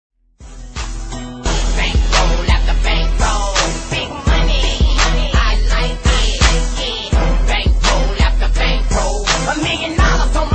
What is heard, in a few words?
instrumental